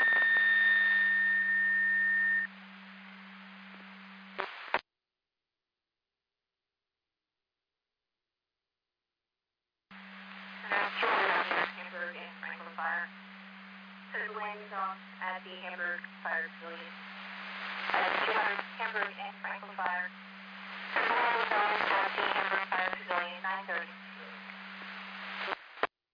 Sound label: Siren; Hamburg FD